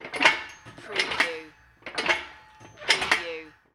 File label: Heavy Machinery